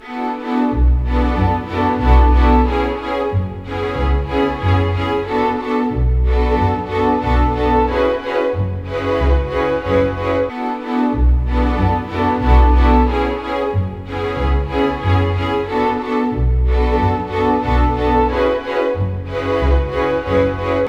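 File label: 120, orchestra; East West; Scoring Tools; Romance Eve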